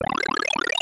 super star get; smw2

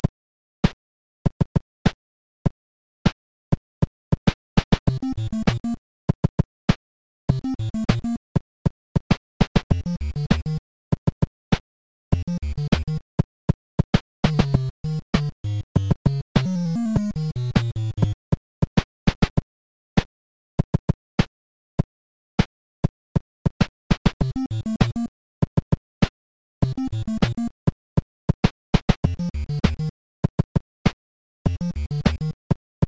underground paused; smb2; proto

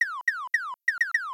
thunder lakitu; smw2

